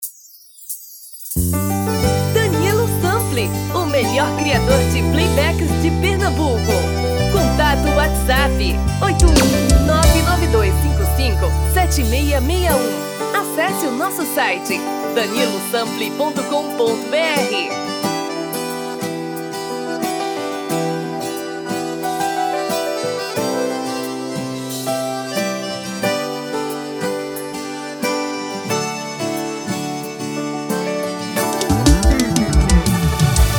FORRO

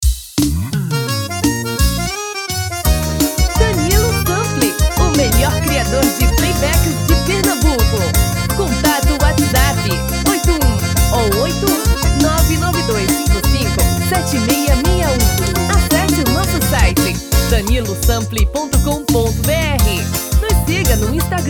XOTE tom feminino

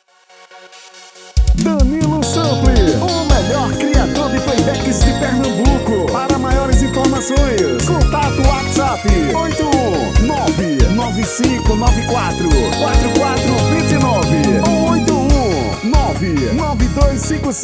vers brega atual

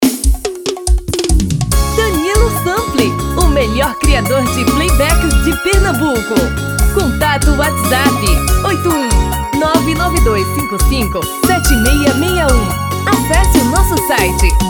Brega; tres tom acima; tom feminino

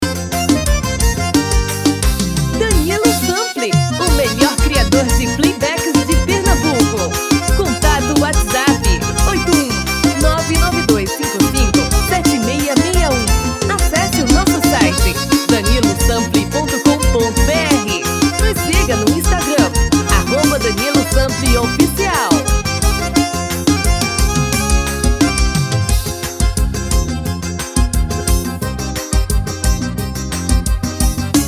feminino

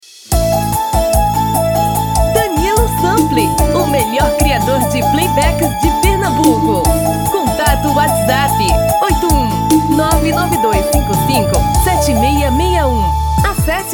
Brega um tom acima

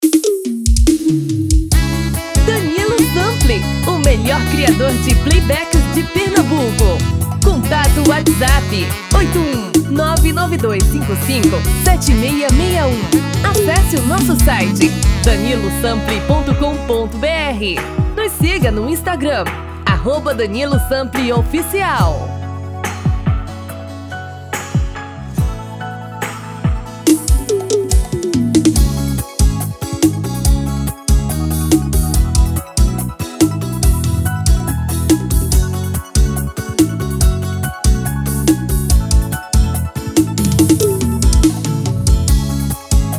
tom feminino

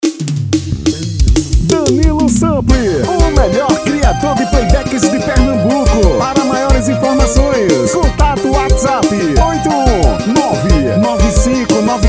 Forro das antigas

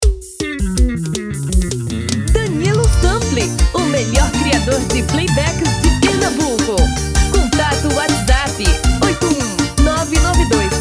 feminino

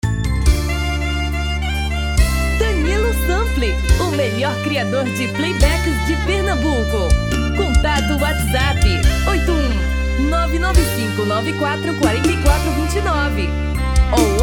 BREGA tom feminino

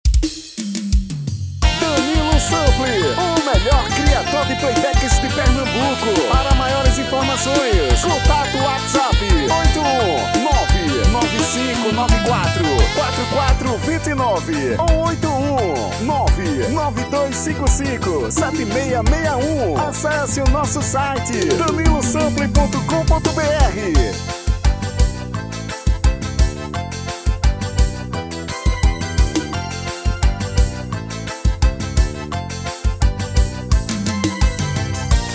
Xote tom feminino

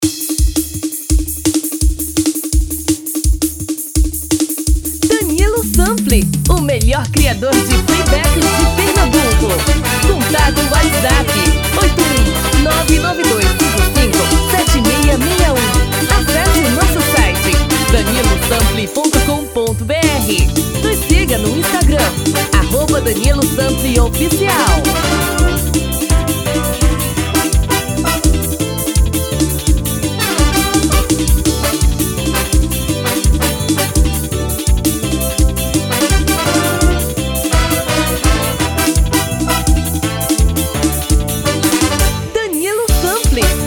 Frevo

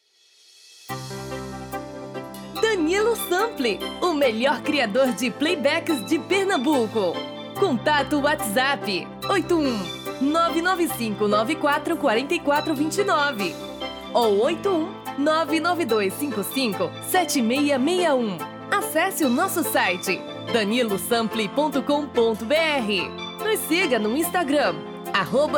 Vers Brega

